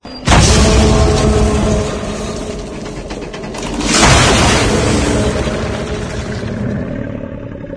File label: smoke; Source engine